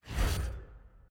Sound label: UI Menu Appear